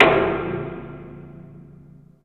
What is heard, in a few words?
Synth Perc; Dream Zone